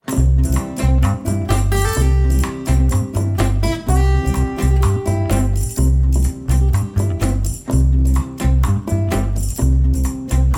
zahlenlied instrumental

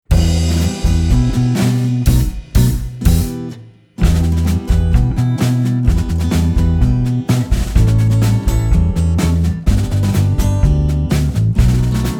the maus extra verse instrumental

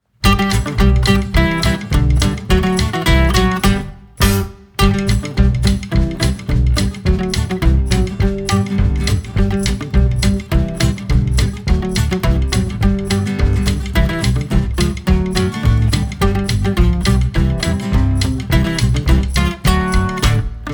hobby song instrumental

